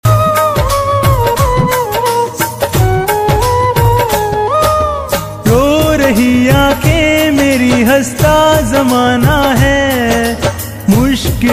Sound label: Khatu Shyam Bhajan